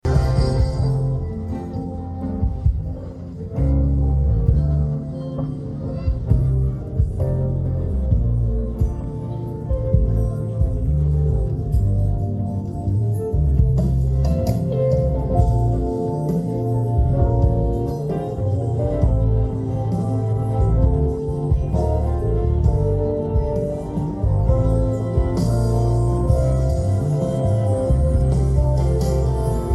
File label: no vocals